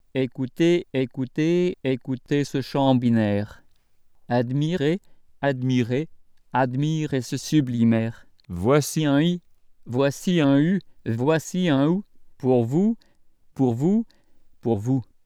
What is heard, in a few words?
phrase Synth; IRCAM; ISSong